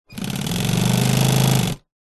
industrial sewing machine operate burst walking foot; brother; zapsplat